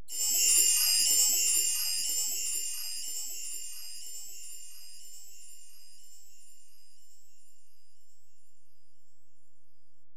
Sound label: Ac Perc, Drum Machines Alesis DM-PRO